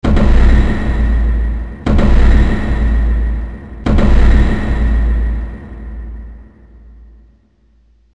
arcade game over; guwange

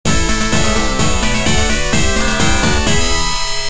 game start

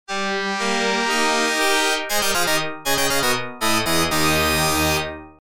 name regist finished 1st place

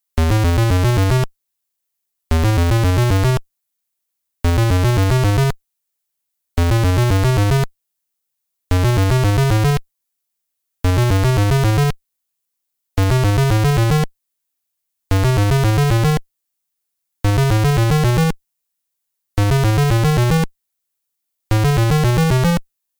bgm

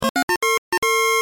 round clear

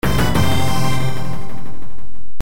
jingle

